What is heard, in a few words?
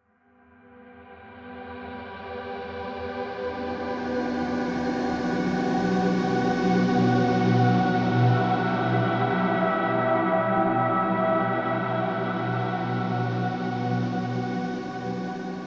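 Ambience Drone Music; Deadcore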